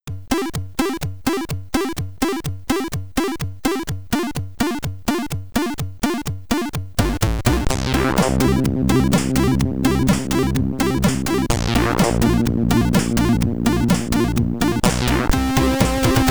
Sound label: Loading Tune (SID Stereo), commodore 64